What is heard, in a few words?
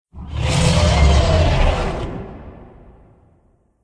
dead boss; croods